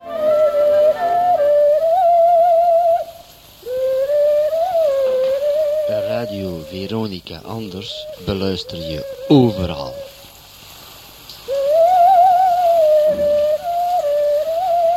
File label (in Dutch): Jingle; Station Calls